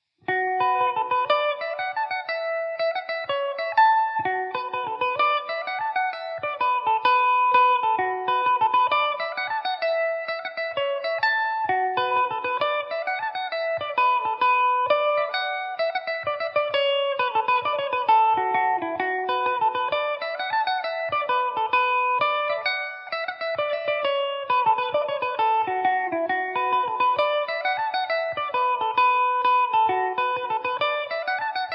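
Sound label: B minor, Irish mandolin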